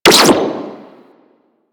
Sentinel Laser Rifle Fire; DE; Warframe; Weapons

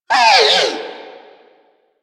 Repair Drone Death; Warframe; Necramech Repair Drone

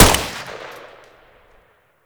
Bullet Gun Fire; Attacks; Warframe; Hyena

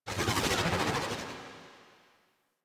Fairy Turn; DE; Warframe

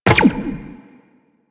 Run Zipline, Footsteps; Warframe; Tenno

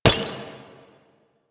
Glass Bullet Impact; Warframe; Ambience; Nineteen Ninety Nine

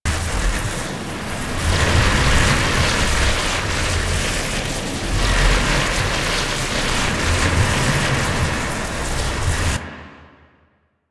Sonic Dissipation Loop; Warframe; Focus; Vazarin

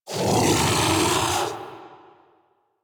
Kubrow Armored Vocal Alerted, Enemies; Warframe

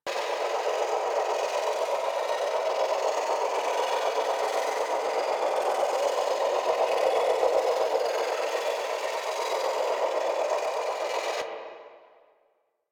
Gloom Active Loop; Warframe; Wraith

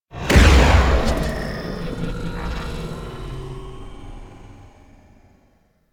Aura Ability; Warframe; Animus; Anima Animus

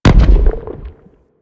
Stomach Grenade Explosion; Warframe; Warframes; Grendel

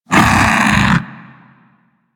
Vocal Pain, critter; Warframe; Hunting Critters; Undazoa

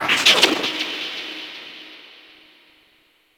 Cephalon Tech Texture Medium, Ambience

